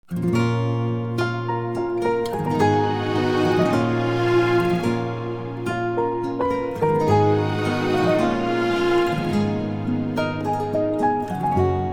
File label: instrumental; persian